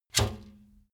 trash basket metal hit rattle

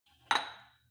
weight rack insert weight soft

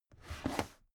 drawer cabinet carton open heavy halfway

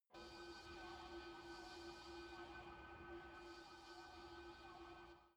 space alien fabric machine far away

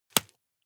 juggle catch hard

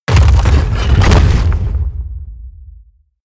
mechanical lever stone large up

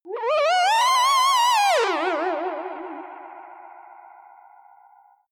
ululation ufo

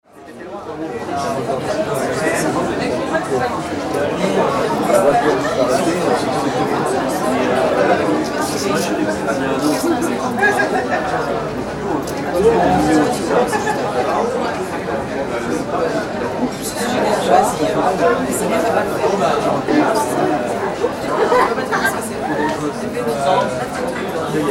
cafe in paris

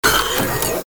electric door

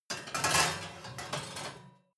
oven baking sheet draw out soft

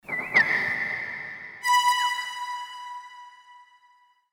hit guitar ambient

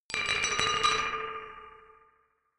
glockenspiel dark tremolo low short

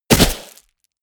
body fall snow hard

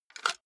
cassette out of walkman

